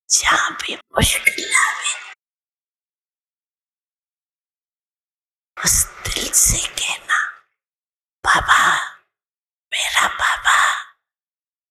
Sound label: Hindi